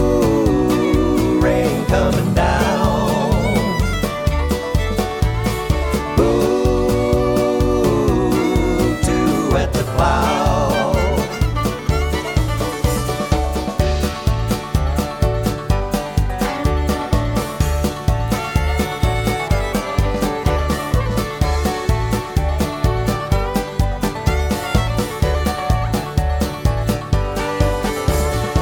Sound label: Background Vocals